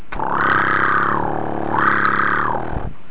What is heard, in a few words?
hawk purr